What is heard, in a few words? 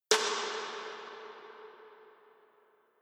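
Reverb Delay snare drum Reverb On; Mix Insert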